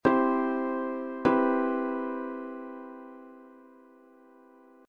tired

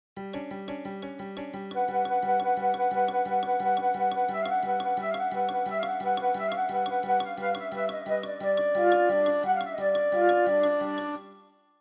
Narrative Music Pop Quiz

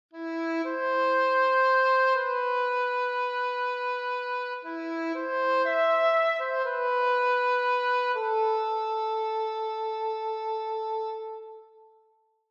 Melody Sadness

